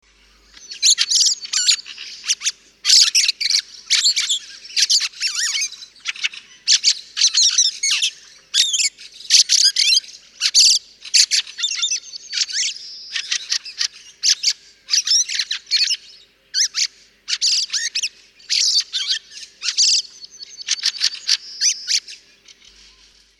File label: Turdus pilaris song; passeriformes; turdidae; birds of the ussr